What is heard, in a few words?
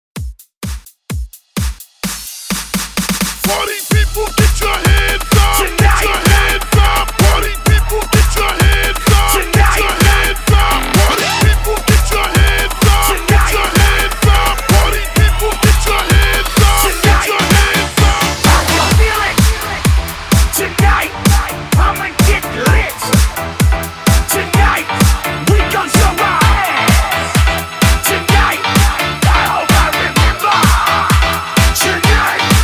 DANCEHALL, 128 BPM